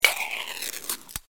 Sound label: CAN SNAP